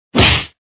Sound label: thwack; trogdor canvas